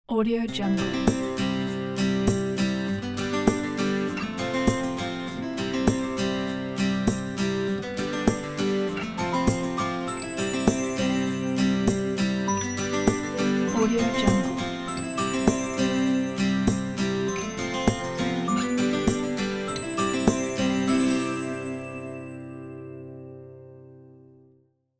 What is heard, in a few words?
summer logo